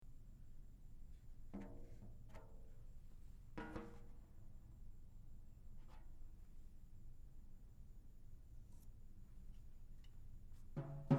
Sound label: Ludwig Clubdate Black Lacquer 12 14 20